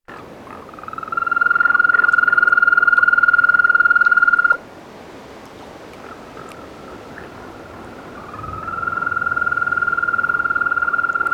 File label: Bufotes boulengeri